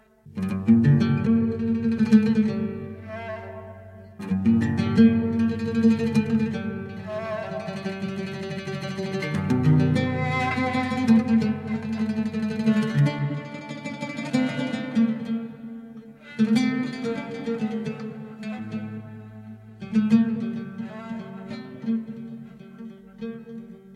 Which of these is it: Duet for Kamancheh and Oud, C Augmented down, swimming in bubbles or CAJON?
Duet for Kamancheh and Oud